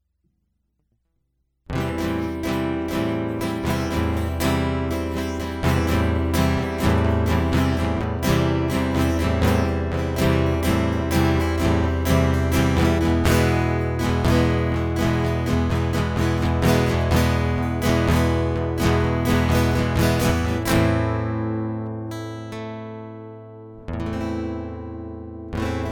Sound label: The Star Cries Guitar Prelude